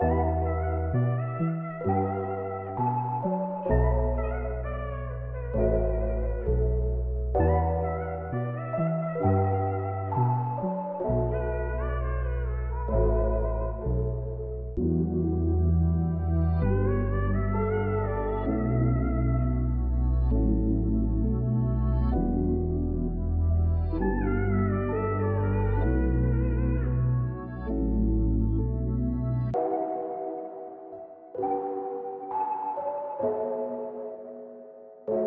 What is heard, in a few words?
One Shot Kit; Alecto; Aliunde